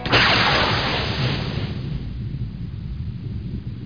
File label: stinger; doom